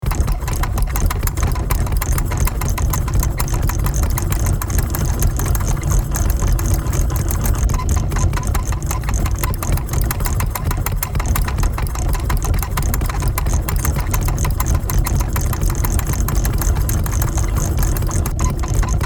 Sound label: Unstable rotating vintage mechanism loop